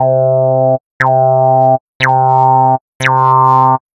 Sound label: filter resonance